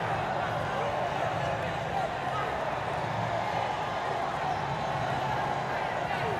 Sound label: crowd idle loop; Hollow Knight